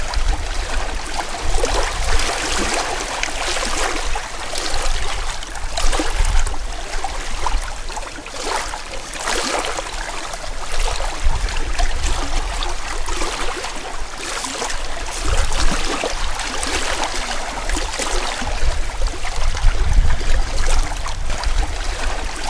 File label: River; Blind Survival